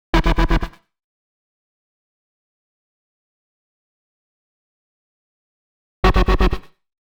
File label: 122 Bm Bass; Prime Loops; Complex Electro Synths; Amplify